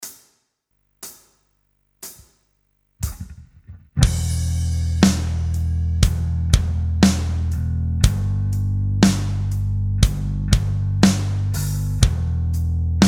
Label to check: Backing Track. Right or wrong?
Right